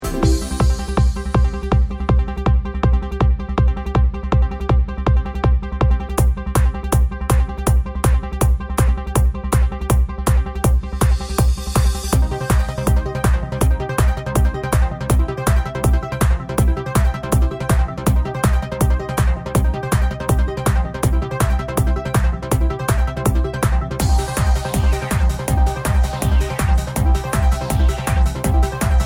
Wave Make Attack 160 to 165 BPM

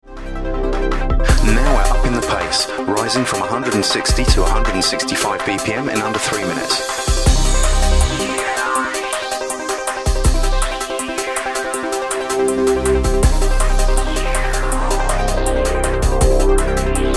160 to 165 BPM

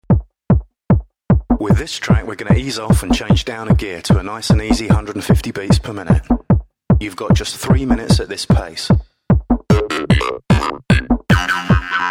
Electrique 150 BPM

